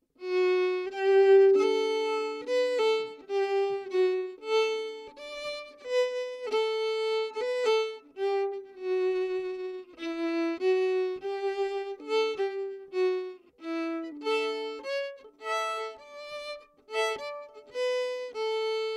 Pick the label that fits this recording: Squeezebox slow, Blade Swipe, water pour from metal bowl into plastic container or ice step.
Squeezebox slow